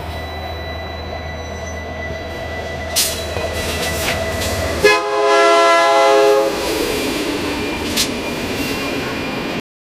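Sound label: train horn, locomotive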